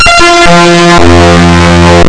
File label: E STRINGS